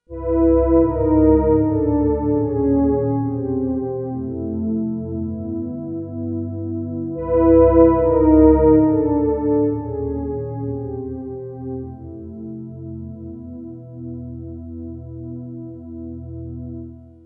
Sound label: string quartet